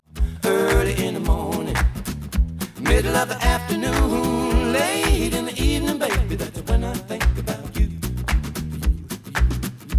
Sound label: noised; 4bit